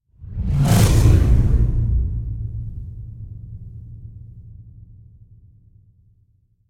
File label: Whoosh Heavy Air Rip Tiger Growl, Drum End; Hollywood Edge; sound designer's toolkit v2